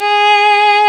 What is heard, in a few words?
ARCO VIOLIN, Arco Solo Violin; E-MU Producer Series Vol. 2 – More Studio Essentials